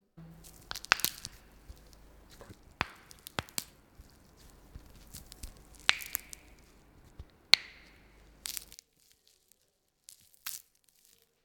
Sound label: Bone Breaking